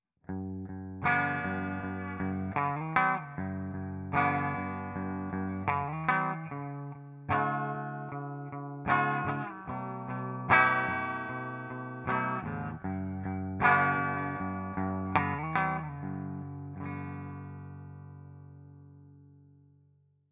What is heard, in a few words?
clean rock bridge; ampli est sur les sons clairs; indie totalnatural; saturation naturelle du micro chevalet